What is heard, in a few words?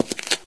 hardboot generic, footsteps; Source Engine; video game; npc